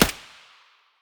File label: aug silencer distant; STALKER; IWP